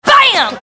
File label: bam